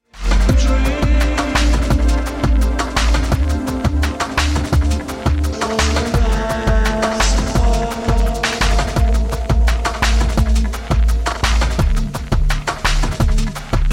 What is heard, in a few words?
machine drum rise n fall